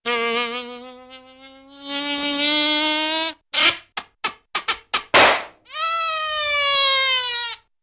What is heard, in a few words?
Funny Fly Buzz Stop